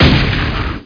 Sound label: rocket, weapons; Quake; PC Gamer 51